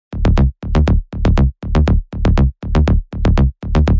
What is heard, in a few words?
psytrance bassline; 120 psytrance